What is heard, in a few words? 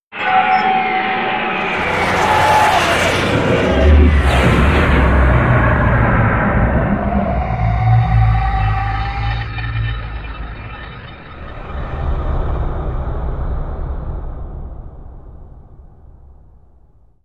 Lo noise